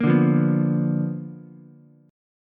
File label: B Minor Major 7th Flat 5th Ukulele; strummed; JGuitar